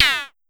Tom Hi Mid, Pollard Syndrum

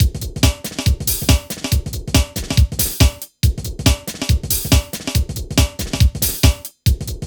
TECHNO42, Funky Xtreams; INIS

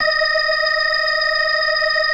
FAST VIB, TREMELO+VIB, Synth and Organ

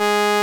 SOLINA, String Machines

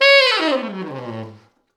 T Sax falls, Horns Sax

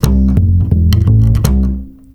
Acoustic 5 F, Basses; Zero-G; Total Drum Bass

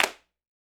CLAP R; Big Fish Audio; Ross Garfield Drums VOL-2; SHILOH KIT

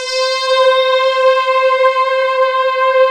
ANALOG SKY, ROLAND D-50; Rubber Chicken Software Sample Bank

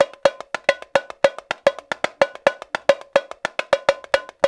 RIO, Rio Batucada; Spectrasonics; Supreme Beats; World Dance

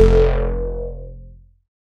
bass F1, Roland MKS-80

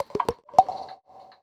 PERCS, Roots Funk; USB Soundscan vol.41; AKAI

